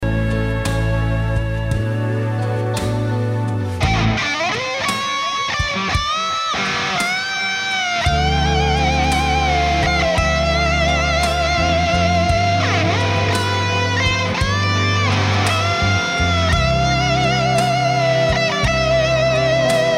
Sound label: parisienne solo